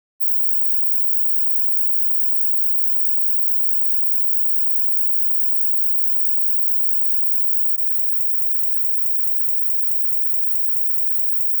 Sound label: Ocean Waves non audible